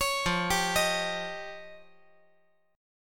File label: F 7th Suspended 2nd Sharp 5th